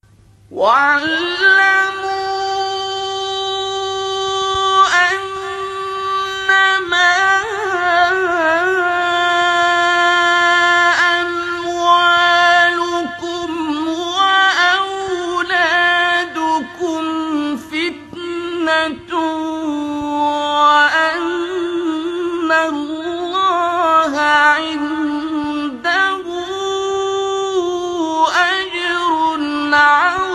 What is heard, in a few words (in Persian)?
تلاوت سوره انفال; آیه ۲۸; استاد عبدالباسط